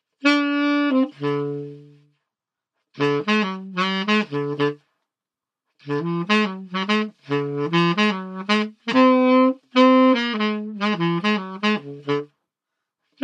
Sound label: saxophone